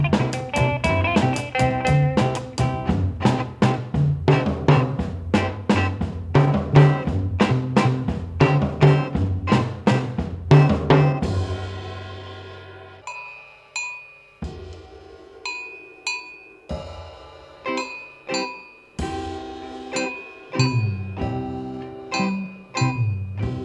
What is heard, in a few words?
Medley (Folk Song)